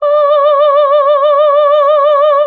vibrato, Voice; Notes

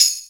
jingle bells

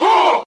huh male, Voice

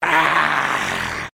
"ah" pain

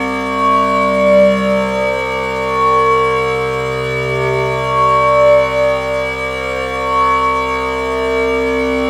buddhist monks, Synths; Notes

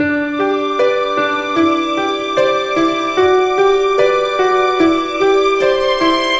piano, Loops, Sad

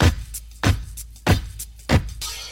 back in ny groove, Drum loops

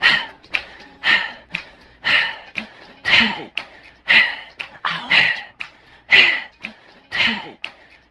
heavy breathing, Drum loops

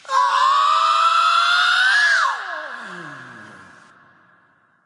awow, voice; ooh ahh; ow ouch

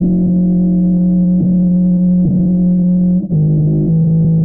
bass

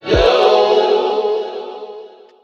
"no" SUNG

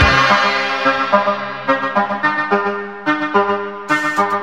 keys explosive; Loops; Phonky

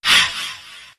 breath exhale; ooh ahh

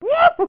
woo hoo cartoon; ooh ahh

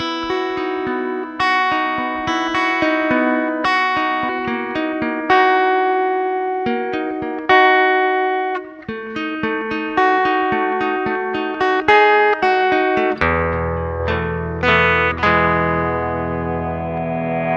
guitar, loops

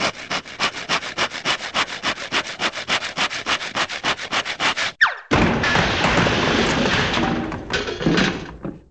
clowns

